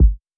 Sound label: Kick Body